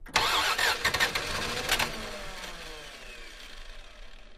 Car; Starts, Idles With Very Heavy Rattles, Off, Front Perspective